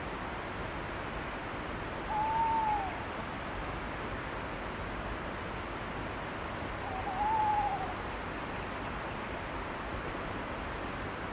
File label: Nightjar